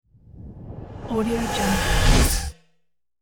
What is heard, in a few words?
eerie synth reverse stinger; hollywoodedge